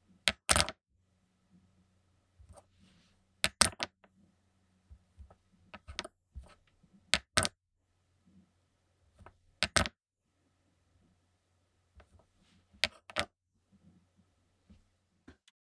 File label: Pen Drop On Desk